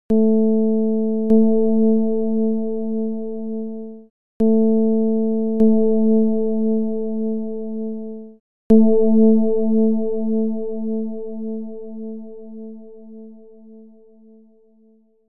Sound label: csound foscil 220hz